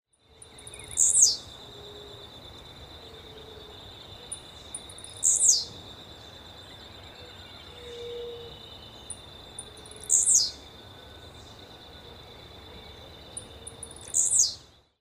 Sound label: Tiziu Volatinia jacarina; Jacauna